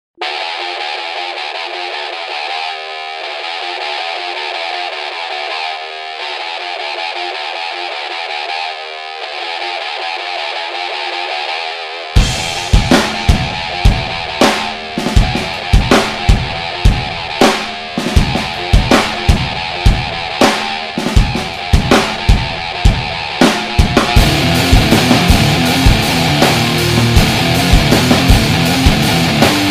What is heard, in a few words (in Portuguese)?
instrumental